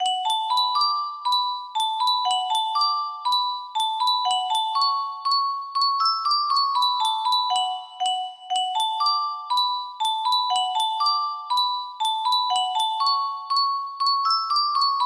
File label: f scale, music box melody